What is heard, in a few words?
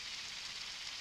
Background Noise